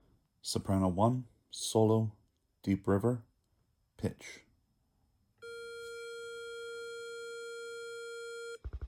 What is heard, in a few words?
solo Soprano I; Deep River; all state choir